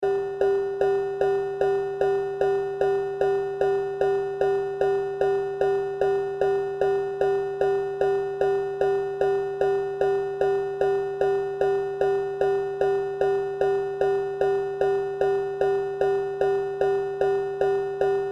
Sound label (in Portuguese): amostra de pulsos com periodicidade